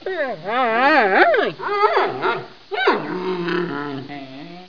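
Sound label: panda golos, panda voice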